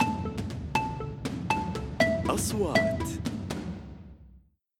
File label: intro drum